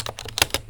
UI Comment Type; PEAK (2025)

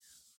Hand Move

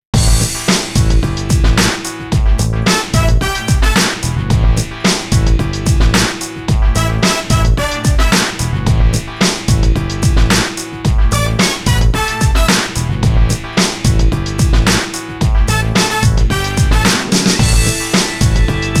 Boxing Opening; Wii Sports Club

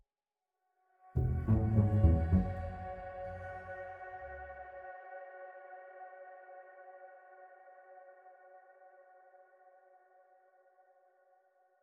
Intro 103bpm; Biome 3 Explore 02; Dragons: Legends of the Nine Realms

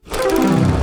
Tap Slot machine; uno

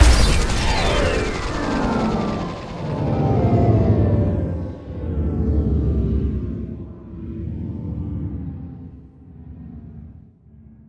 Flash Plant

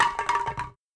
Impact Can

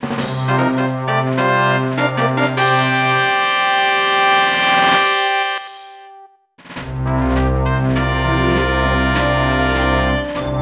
Final Battle Clear Theme; Super Mario 64; N64

